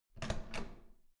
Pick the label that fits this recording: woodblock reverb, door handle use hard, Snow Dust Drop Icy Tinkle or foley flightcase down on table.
door handle use hard